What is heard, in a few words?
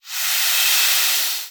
Rattle Snake